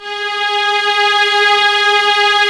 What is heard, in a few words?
VLS G#4, Violas; Miroslav Vitous; VLS 1+2